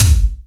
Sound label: BD, Yamaha RY-10, Drums